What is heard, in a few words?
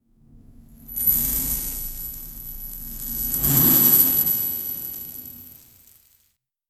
bicycle brake gentle